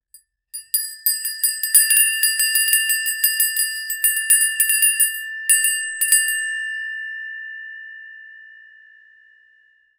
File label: egyptian wind chime with; wind chimes